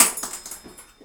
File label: Finger Hit, Prepared Kit; Battery 3 Sample Kits